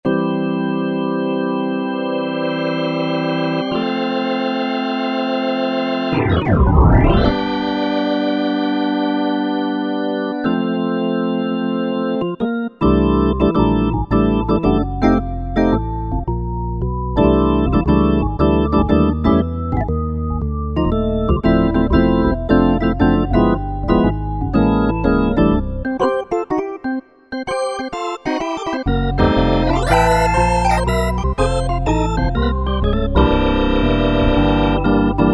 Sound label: Korg Wavestation Vektor Organ